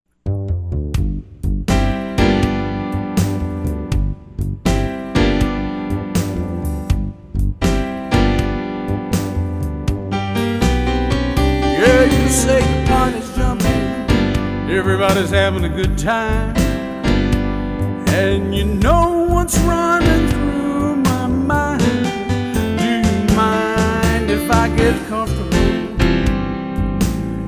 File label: downhome blues